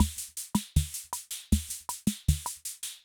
Loop, rhythm king; classic machines

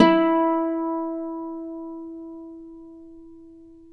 Celtic Harp, Plucked Strings

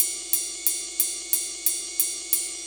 Cymbal Loop; Sonic Foundry (Sony Creative Software) - Fluid Dynamics Drum & Bass; Construction Kit

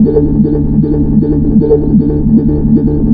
low seq; Big Beat Samples & More; AKAI; ESO SEQS KIT

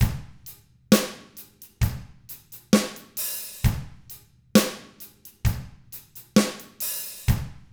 SLOW ROCK, Drums; ILIO; Double Platinum Drums 1

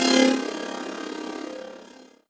Dance Mega Synth; Best Service; 90 s Sample CDs

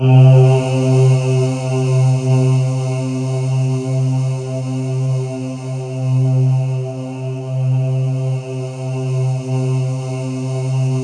C2, Synth Leads; 90 s Sample CDs; NiceBeats (NiceBytes); Studioline 09

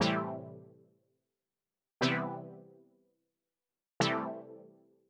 120 Stabs A, Keys, Nu Jazz House; PowerFX

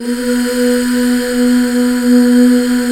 UUHH, Back Uuh Fem

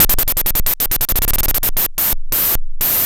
Pulse, Filtered Noise

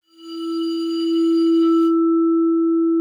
ARCO E4, VIBES; Xsample VOL-4

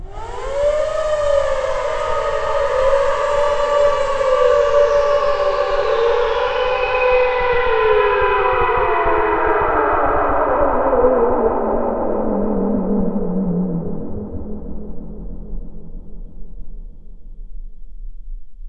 alarm; Masterbits - Tekkno Trax; MACHINES+FX

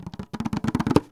INDIAN DR A6; Spectrasonics; Heart of Asia; INDIAN SET

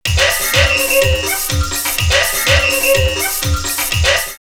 124, drumloops, Techno&House; X-Static Goldmine; 90 s Sample CDs

